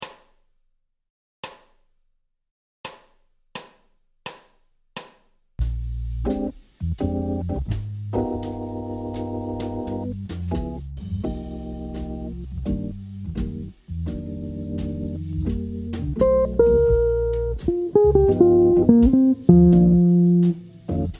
Turnaround, Turnaround en mode de Bb Mixolydien sur toute la progression ‘ I7 VI7 iim7 V7’; jazz blues; guitare jazz; jazz blues turnarounds

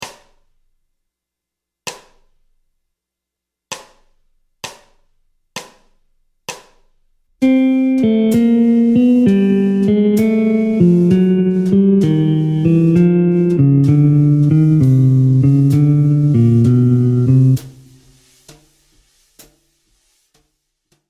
Gamme bop desc Pos 52 C min mel, guitare jazz; boper ses gammes position 52

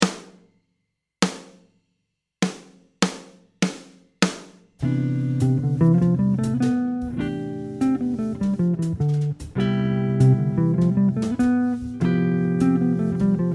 mode ionien en C, guitare jazz

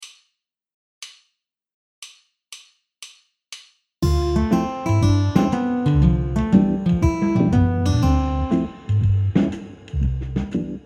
arp ii V7 I ∆ en C Maj, guitare jazz

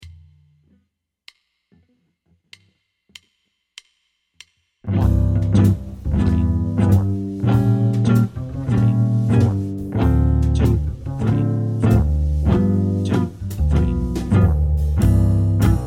pompe swing acc swing mediator

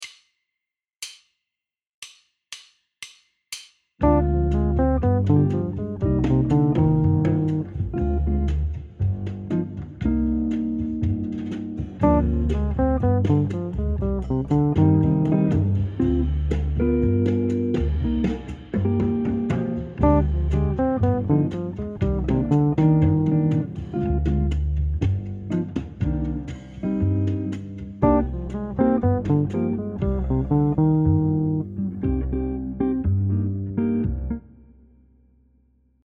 arp sur C min harm; gamme mineure harmonique

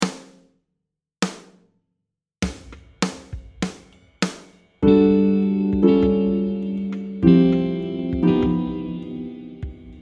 i vi ii v7 courts, guitare jazz

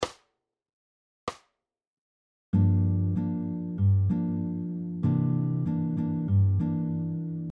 rythmique bossa

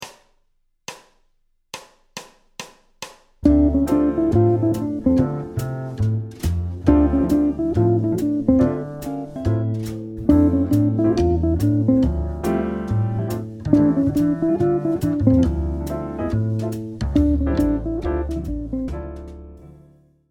Phrase sur Dm7, guitare jazz; motifs sur accords mineurs

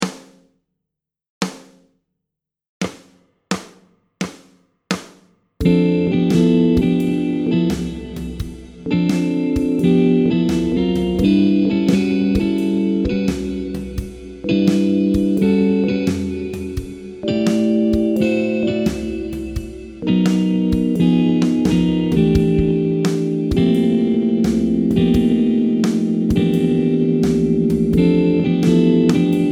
drop 2, guitare jazz

